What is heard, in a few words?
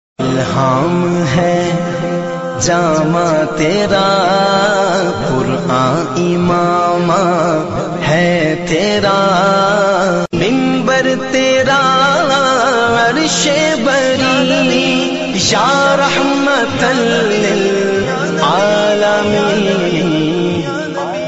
islamic ringtone